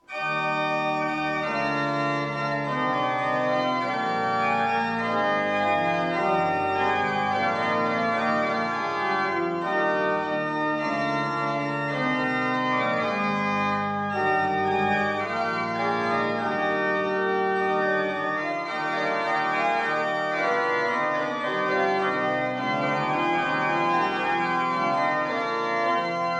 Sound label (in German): Tochter Zion Choralvorspiel